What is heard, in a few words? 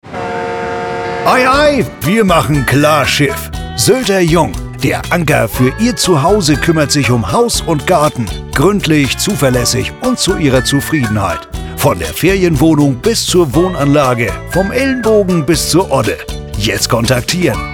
Jingle